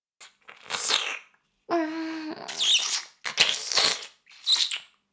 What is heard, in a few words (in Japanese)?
耳舐め音声, ASMR; 少尉; 牧場戦腺